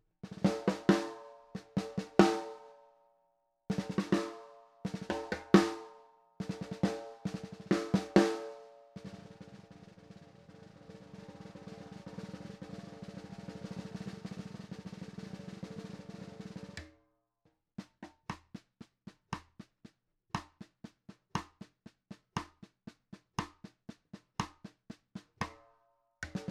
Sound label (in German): Snare Groß tiefe Stimmung; PDP